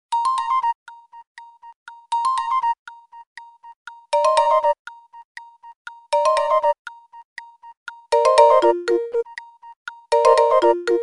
Clock, Nokia Tones; Windows Phone apps; Clock 7 (Clocksmith)

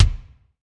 Kick Room

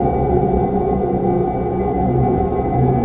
The Roaring Abyss; Sound FX

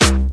bombo, kicks, Hardcore

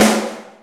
Snare, Yamaha RY-30 SNARES

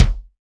Kick Dry Loose

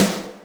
snaredrum, Yamaha RM 50

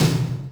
Yamaha RM 50 Toms

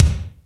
Bassdrum, Roland D-70; Drum Samples

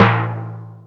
Tom, Roland Digital Drum Brain DDR-30; Drum Samples

